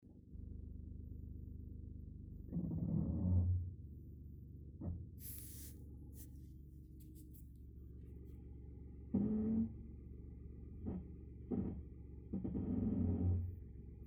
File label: brake noise, mitsubishi outlander